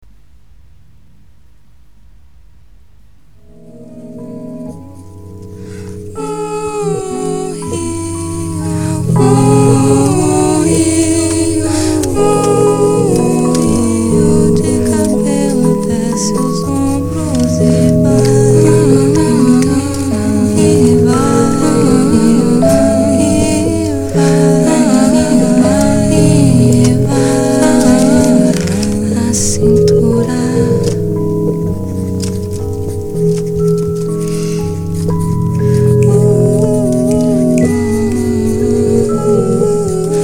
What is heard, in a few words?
piano